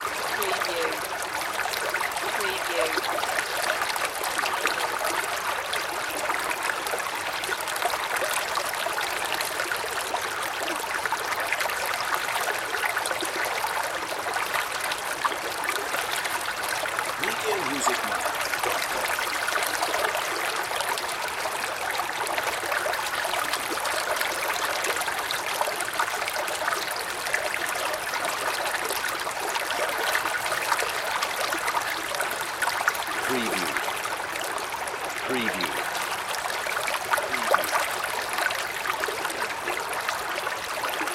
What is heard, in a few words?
creek